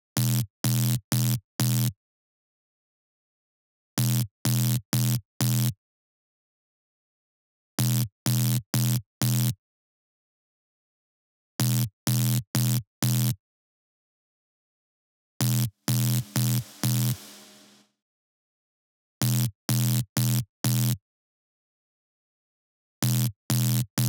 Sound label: Synth, Dirty Bass, DRY, 126BPM, Em